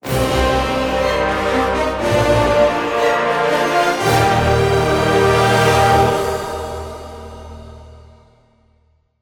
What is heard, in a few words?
Horn Destroyed Music; StormKing